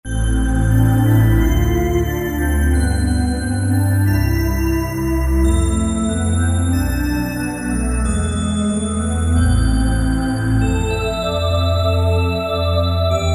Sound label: Sparkling Voices